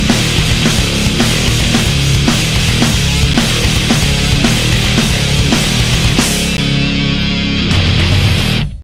outtro music; makavalz

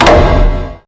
clock tick; gearmaster

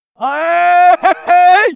win; freak fortress 2; some clean trash

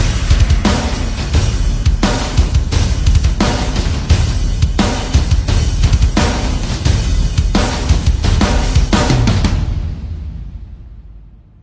outtro music; iberia

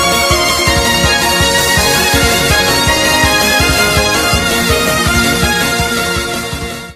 outtro music; freak fortress 2

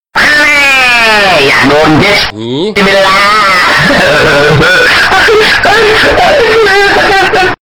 backstab; ff2

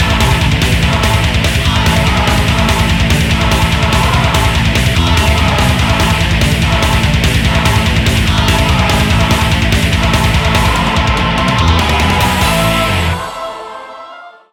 intro music; not true admin

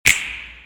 snap; black silence

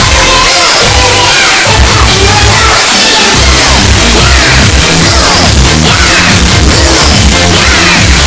outtro music lose

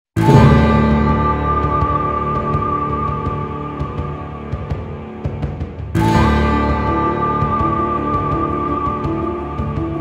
intro music; bounty hunter

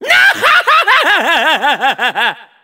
laugh; hametsu

